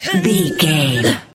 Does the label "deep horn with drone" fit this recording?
No